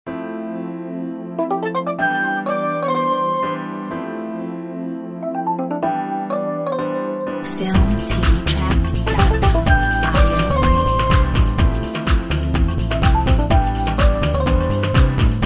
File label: Uptown Jazz